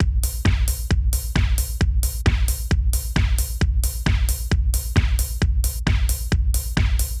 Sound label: beat brutal 133